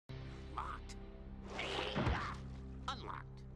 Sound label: unlocked